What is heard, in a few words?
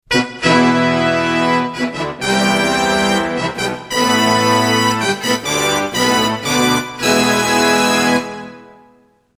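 fanfare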